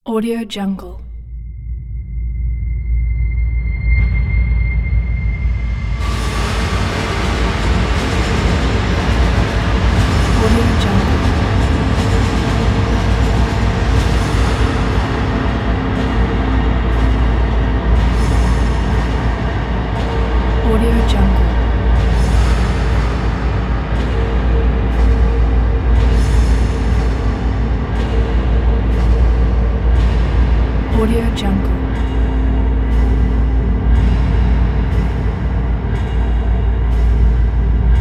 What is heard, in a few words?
horror dark ambient